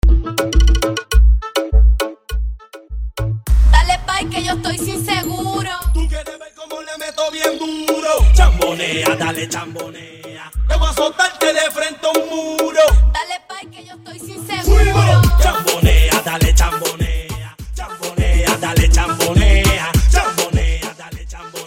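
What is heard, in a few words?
intro shatta to reggaeton old 102 bpm; Prodeejay Remix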